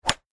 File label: hit, baseball Ball Bat Glove